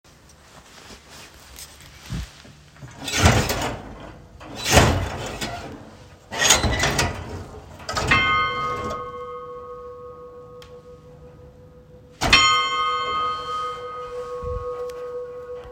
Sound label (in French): Cloche Mairie; Malauzat; Patrimoine